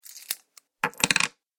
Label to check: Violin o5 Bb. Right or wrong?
Wrong